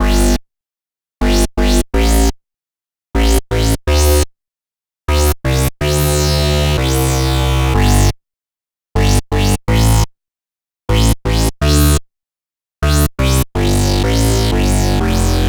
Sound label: 124 Dm Lead Synths, Electro; Prime Loops; Complex Electro Synths; Invaders